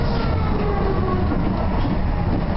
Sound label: Motor, Train; OpenBVE